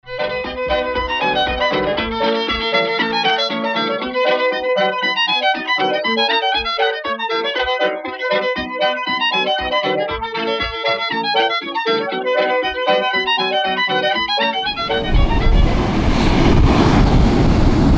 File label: PAD